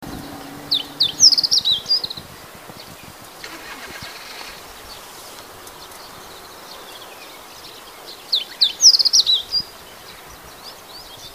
hojiro, birds

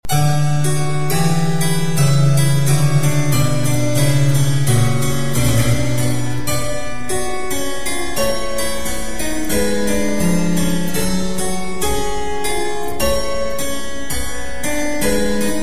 baroque

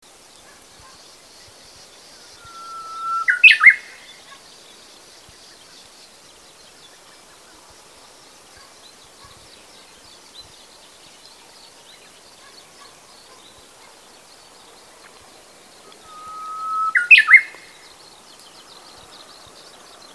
uguisu, birds

